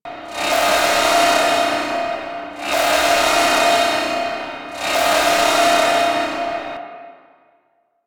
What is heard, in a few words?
Factory Alarm; Venus; Warframe; Veilbreaker; Quests; Kahl